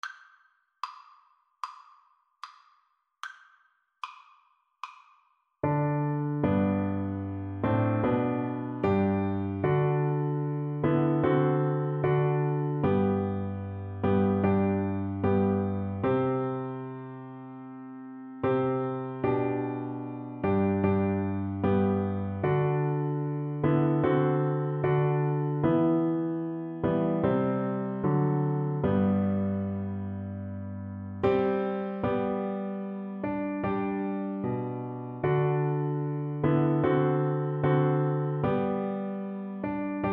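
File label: recorder karaoke; auld lang syne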